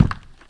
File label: Foot Step Orc; Legend of Keepers